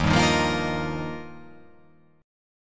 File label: D sharp Major Add 9th